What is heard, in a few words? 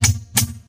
synth tick tock; video games; ambient; machines; source engine; portal2